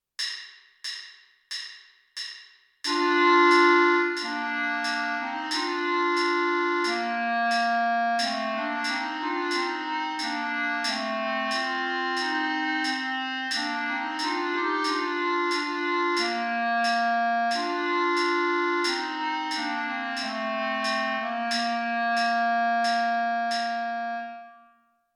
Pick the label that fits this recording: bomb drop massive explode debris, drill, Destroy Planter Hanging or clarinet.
clarinet